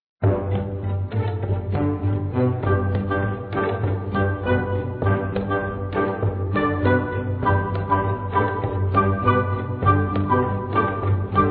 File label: soundtrack